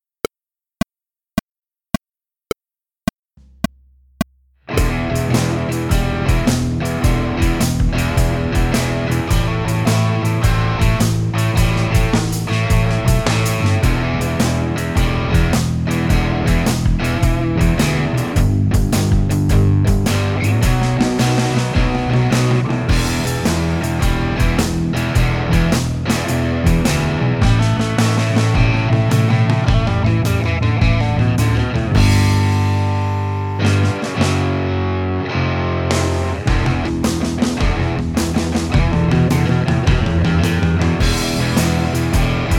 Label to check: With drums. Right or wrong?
Right